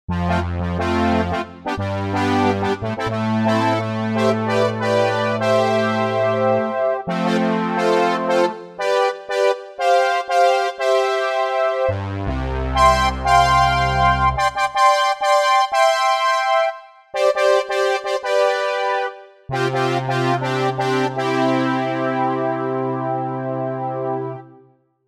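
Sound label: Pro-5 brass; Keys; Roland SRX-07 Ultimate Keys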